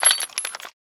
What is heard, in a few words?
Gear Inventory UI; User Interface